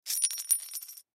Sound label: Coin Slide Single Pirate Gold Concrete; foley; Money Sound Effects; Objects; Blastwave